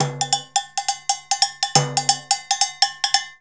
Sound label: cowbell